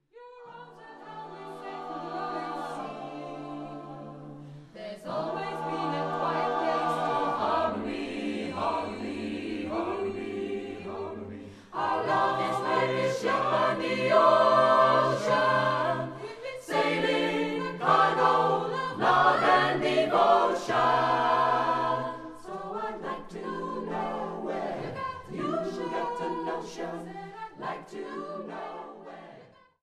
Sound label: ssatb